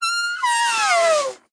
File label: balloon tap; pinpals game